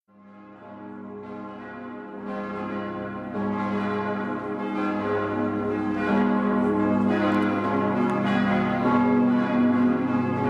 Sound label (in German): glocken andechs